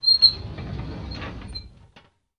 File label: Drawer Metal Open Qu